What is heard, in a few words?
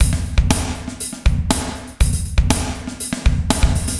120 POWER, drums